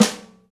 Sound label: Folk Snare; Snares